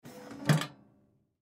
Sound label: Metal Drawer Squeak, Foley Metal Drawer Squeaky Open; Household; Blastwave